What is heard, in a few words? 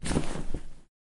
Bag Open